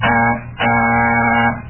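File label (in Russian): жужжащий звук дверного звонка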